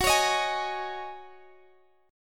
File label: G sharp 7th Suspended 4th F sharp